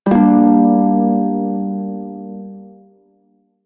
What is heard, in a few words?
Prime Shawzin Min Chord H; Music; Warframe